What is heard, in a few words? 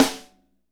snare, Hip-Hop Rap; Northstar; Drumscapes Roland